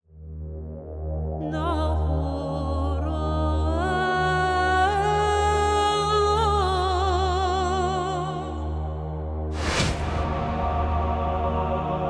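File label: Humans Ambience; Disciples III Reincarnation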